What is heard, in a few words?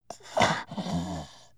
VO sleep, creatures organic